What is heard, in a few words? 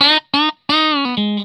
RIFF 120, Vintage Blues Guitar